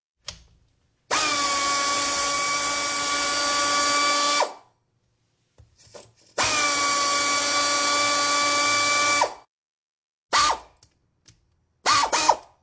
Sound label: Beeps; 헐리웃특수효과음; 신호-경적